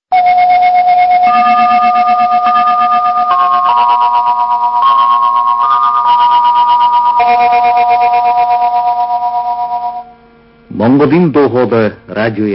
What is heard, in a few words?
interval signal; vo mongolia